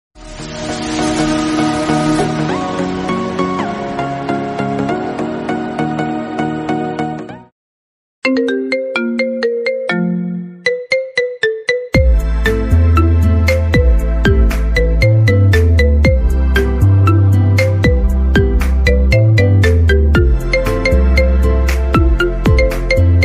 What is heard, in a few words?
english ringtone bgm ringtone iphone